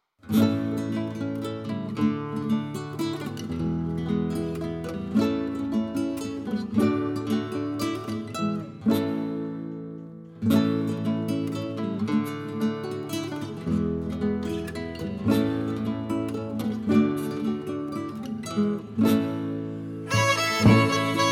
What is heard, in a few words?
Polska; Polska efter Pelle Petersson